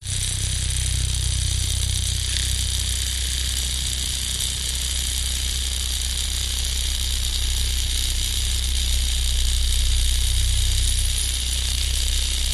science fiction micro flying drone helicopter propellers flying; zapsplat